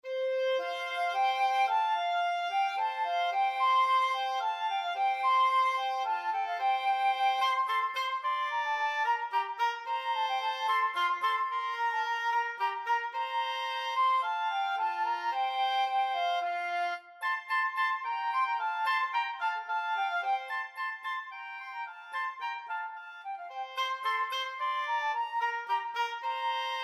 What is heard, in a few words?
flute oboe, flute-oboe duet; handel water music